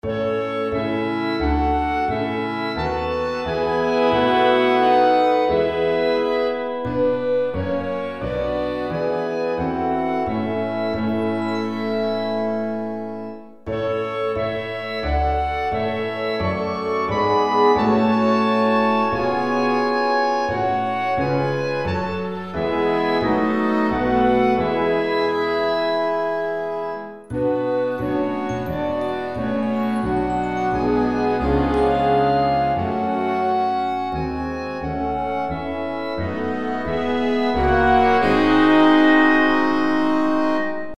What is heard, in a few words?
concert band